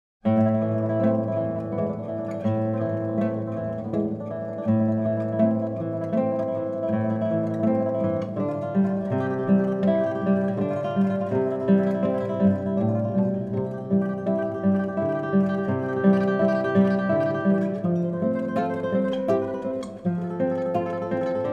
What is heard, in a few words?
Two Guitars; Recuerdos de la Alhambra by Francisco Tarrega